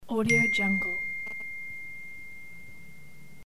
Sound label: new message notification